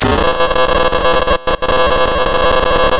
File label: Sound of tearing paper, center